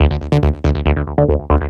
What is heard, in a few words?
NRG Bass, Trance; Basses; Sonic Foundry (Sony Creative Software); Trance NRG VOL-1